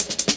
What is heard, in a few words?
kicker cut, drum loops; Zero-G Jungle Warfare 1, 2 & 3